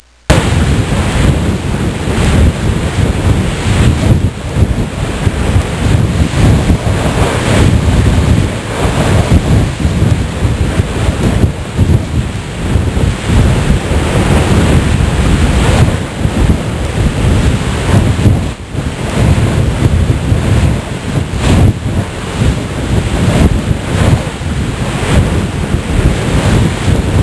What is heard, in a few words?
Ocean Waves; Soundsation; Nature